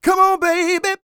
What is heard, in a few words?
SING, Vocal Planet R&B & Dance; ILIO